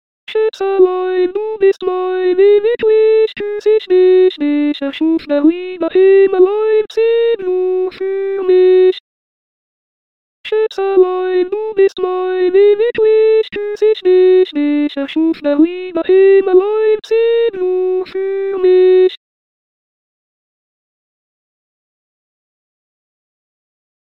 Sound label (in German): Zigeunerlieder alto